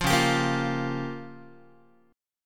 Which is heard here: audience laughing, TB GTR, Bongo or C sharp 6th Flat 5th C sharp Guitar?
C sharp 6th Flat 5th C sharp Guitar